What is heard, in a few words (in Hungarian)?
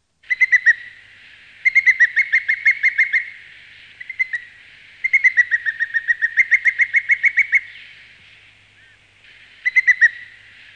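Törpesas; Madarak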